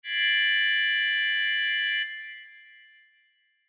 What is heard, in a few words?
Sho